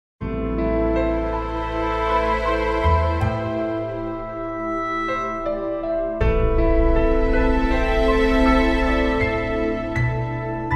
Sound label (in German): Entspannungsmusik für Babys